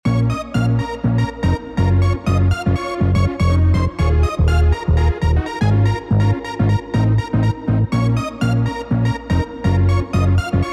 COMPLEX loop

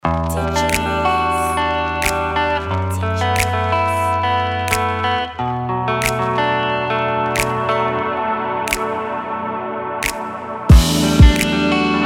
Pop Guitars; Diginoiz; Pop Guitars 5

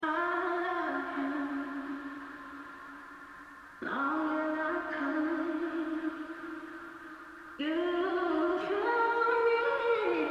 Rnb; 10 in 1 vintage soul and rnb bundle by ric beats and nioredelic

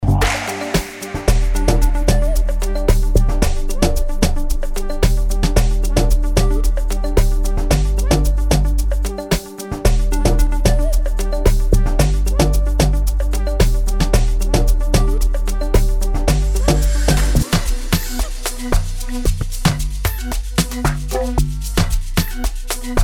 Amapiano Loop Kit; Sgicha; 2025 super amapiano bundle by sliquejams

